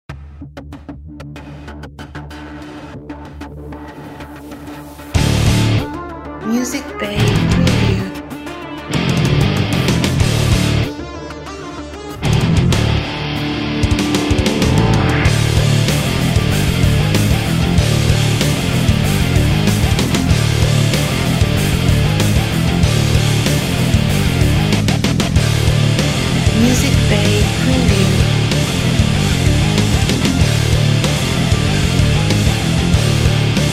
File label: Short Metal Trailer